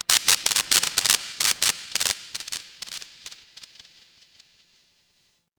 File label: fire electric spark